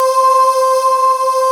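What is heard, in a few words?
organ vox C5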